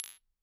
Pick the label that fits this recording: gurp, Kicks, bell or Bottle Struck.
Bottle Struck